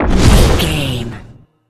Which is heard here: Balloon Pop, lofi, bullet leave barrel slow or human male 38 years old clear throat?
bullet leave barrel slow